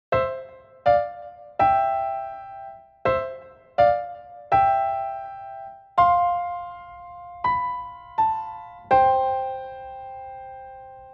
lullaby 82

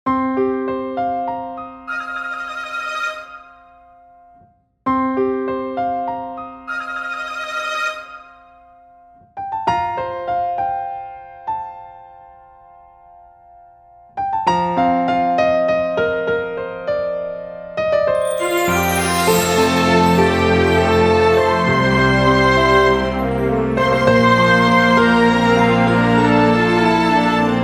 Nostalgy 100

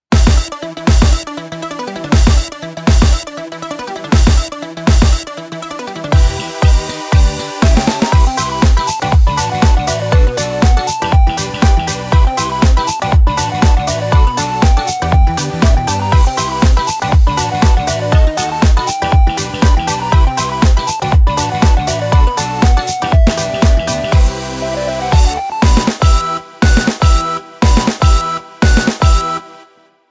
Synth Dance 120

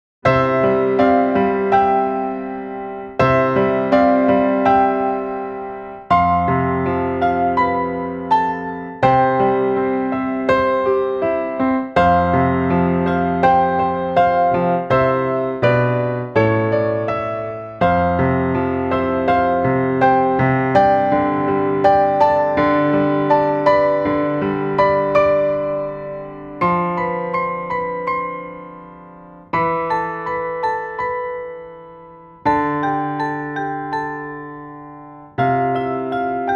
lullaby 82 short

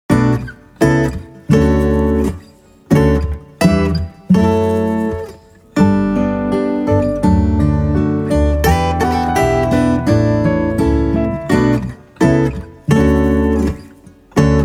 acoustic 84

